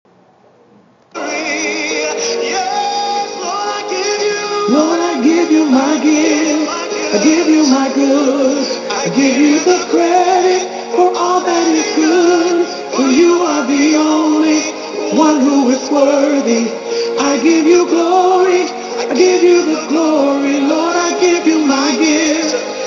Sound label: alto